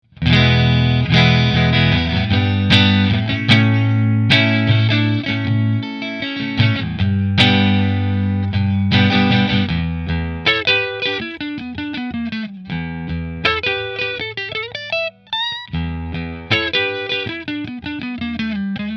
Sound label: Rahan Position 5 Fender, guitars; Rahan Guitars RP Double Cutaway Green Flame Maple Top